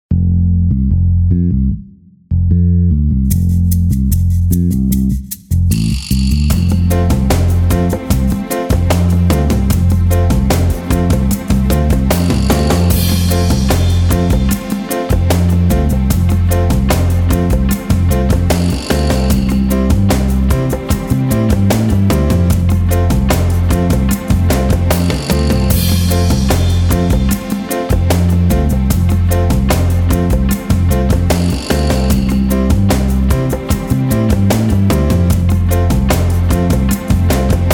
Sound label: Just Reggae